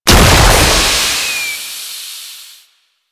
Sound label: Purification Machine Explosion; Warframe; Nineteeen Ninety Nine; Infested Survival